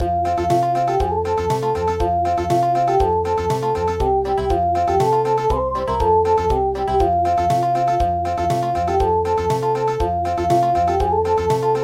Country; traditional

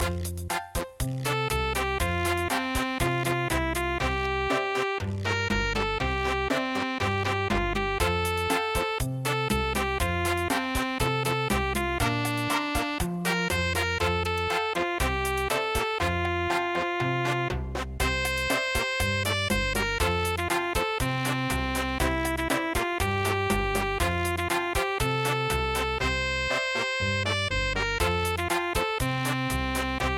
yamanoue chachacha

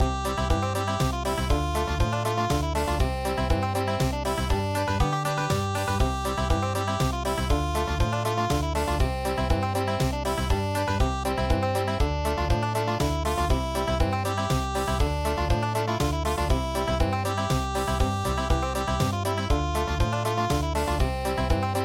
German Festival Country

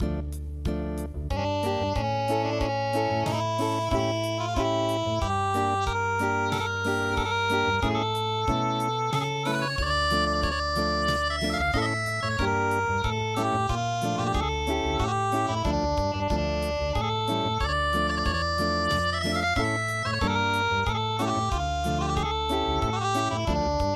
Acoustic

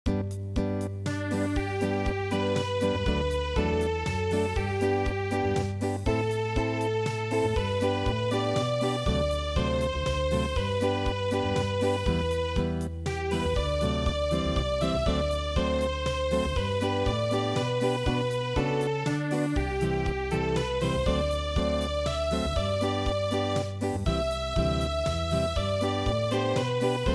Unplugged, traditional; midi

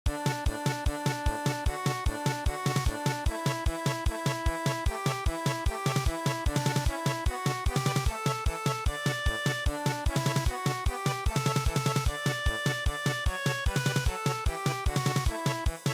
German Polka

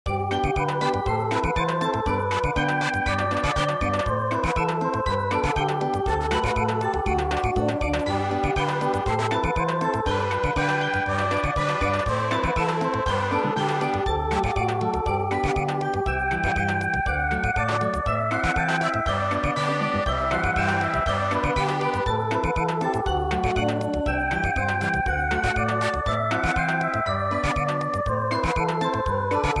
Samba

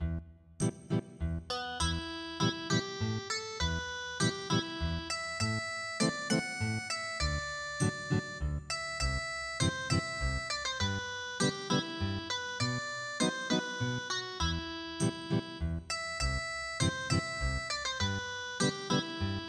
Ethnic Guitar

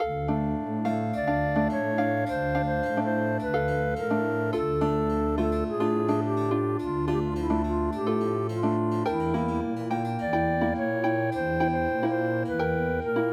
Habanera Flute Music

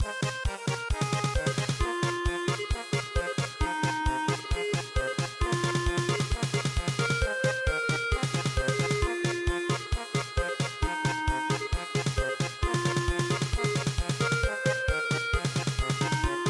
German Polka, traditional

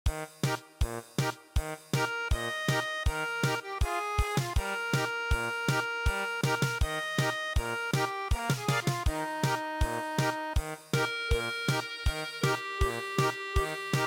German Polka

